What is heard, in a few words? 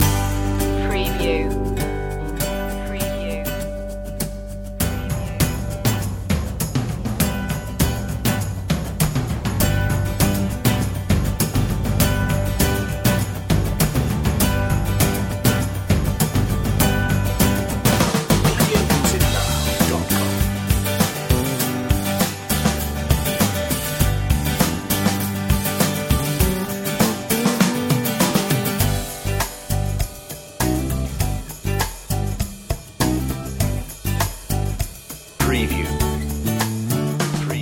power of now loop